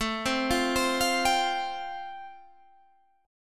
A-Minor 7th Sharp 5th, Guitar Half Step Down, chord